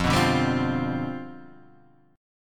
C Major 7th Suspended 2nd Suspended 4th F, chord

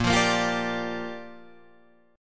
A sharp 6th, Guitar Drop D

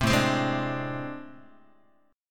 A Minor Major 7th Double Flat 5th A, Guitar; strummed; JGuitar

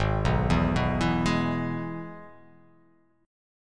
G sharp Minor Sharp 5th

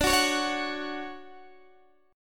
D Major 7th, D, down, Guitar DADGAD

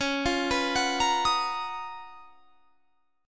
Db 13th Db; arpeggiated

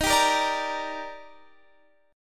G Minor Eb, chord; Gm/Eb strummed

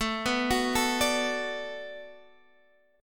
B Minor 7th A, chord

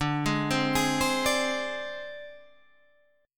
Ab Major Flat 5th D

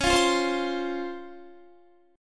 C sharp Major 7th, Guitar; strummed; 20,20,17,17,17,20

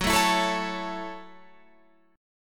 F sharp Minor 7th F sharp, Guitar Open F; F#m7 strummed

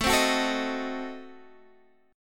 A Diminished 7th, Guitar Half Step Down

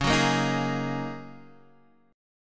Gb 6th Add 9th Db, guitar

